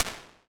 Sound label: Wooden Room, impulse responses, small spaces, rooms; Apple